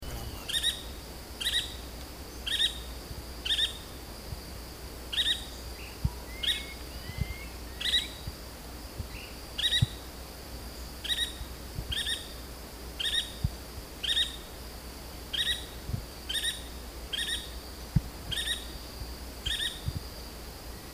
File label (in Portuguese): canto do benedito de testa amarela